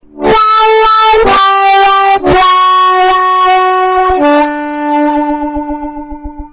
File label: Hand Wah